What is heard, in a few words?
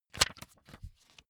magazine flip back fast